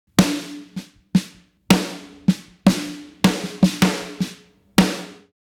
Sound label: snare drum with 200 hz added